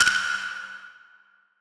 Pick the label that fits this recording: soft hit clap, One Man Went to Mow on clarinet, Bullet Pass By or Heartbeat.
soft hit clap